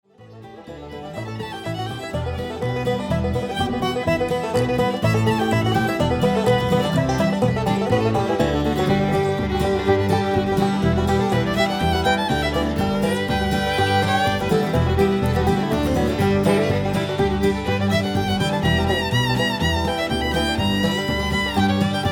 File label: irish banjo medley